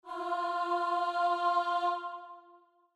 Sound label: starting note, voice; fast and playful